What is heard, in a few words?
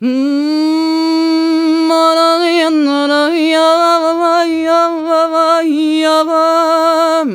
VOICE, Balkanish Traditions; USB Soundscan vol.63; AKAI